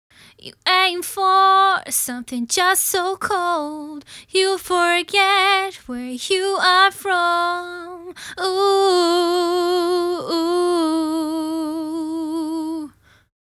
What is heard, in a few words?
VOCAL DRY; Function Loops Vocals Bundle Vol 2